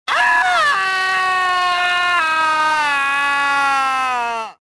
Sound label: falling; reborn